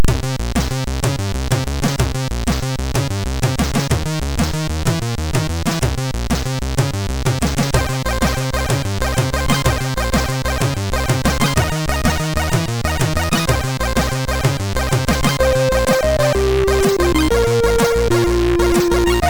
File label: sid, MOS6581